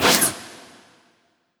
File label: panel in